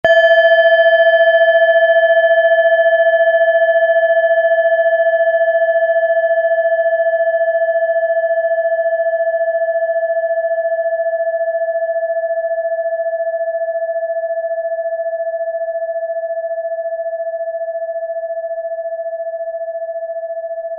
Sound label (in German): klangschale alpaka